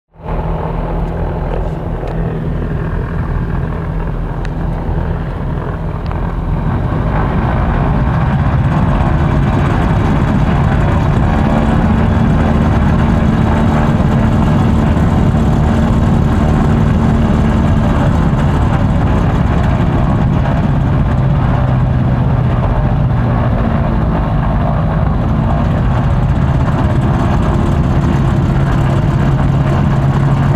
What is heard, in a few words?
engine noise detonation bad fuel